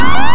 space alarm, alarm kit; Big Beat Samples & More; AKAI

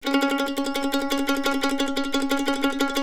CEE TRILL C# R, Blues Guitar

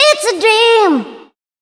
Dance Vocals, FEMALE

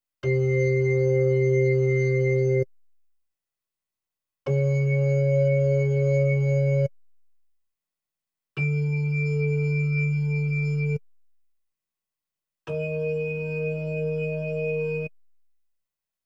B3 Organ; Masterbits CLIMAX 8

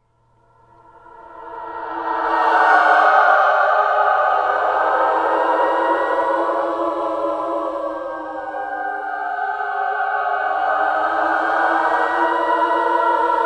WINDY MOAN; VERTIGO

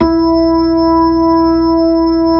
B-3, Keys of the 60s and 70s

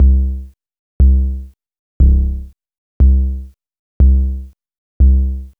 Moog bass; AMG - Vince Clarke Lucky Bastard

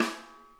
RIM, snare; Sonic Reality; Interactive Drum Kits

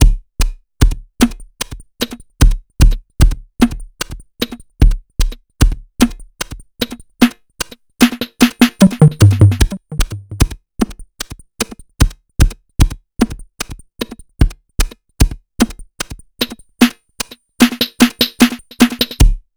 TECHNO, Funky Xtreams; INIS

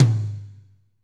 tom, Pop Country; Northstar; Drumscapes Roland

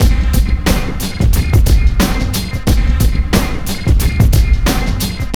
TRIP 90, trip loop

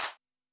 CLAP, Yamaha SY85 Drums

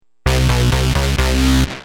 Heavy, Bass; Industrial

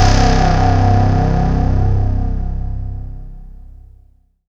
C2, Synth Line; NiceBeats

